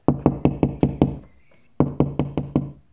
Knocking

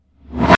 Riser

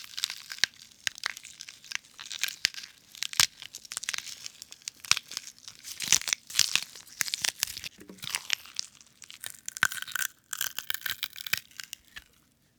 Breaking Bones

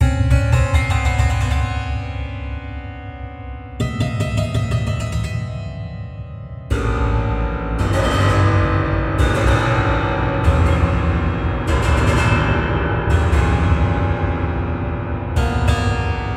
Haunted Piano

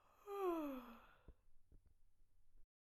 Yawning

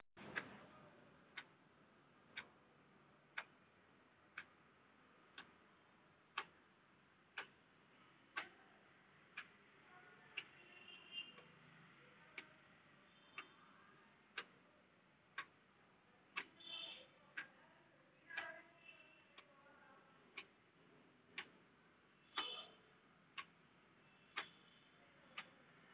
Clock Tik

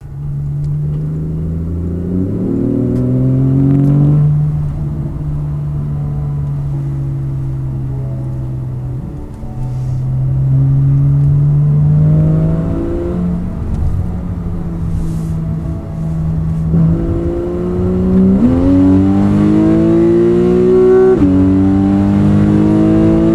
Porsche 911 Carrera S Autobahn

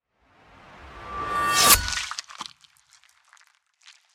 Spear Penetration

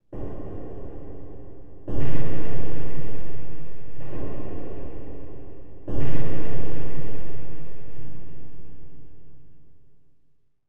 Distant

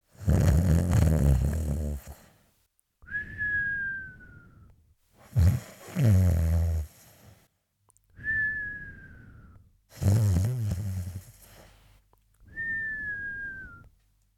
Snore

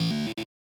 Button Sound Closed

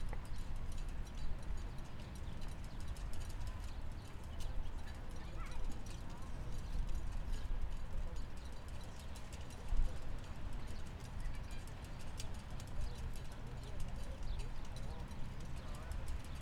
Barcelona Port

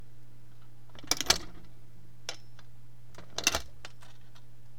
Lock

